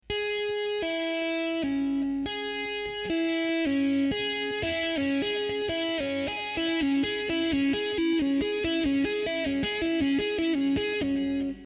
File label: pull, guitare